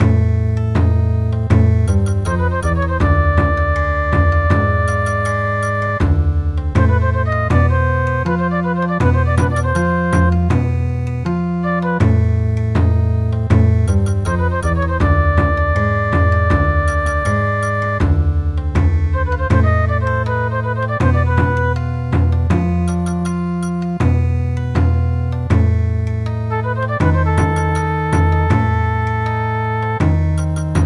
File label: Bon Odori, midi